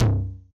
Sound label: Yamaha DJX 2B, Hard Tom